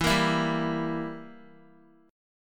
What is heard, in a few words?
E Diminished down Guitar; Edim strummed; JGuitar